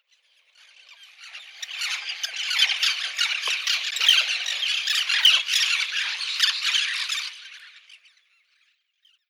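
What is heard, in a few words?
Roseate Tern colony; ikema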